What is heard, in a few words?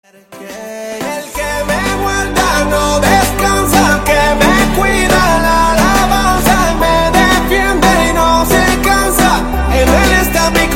Promesas Funky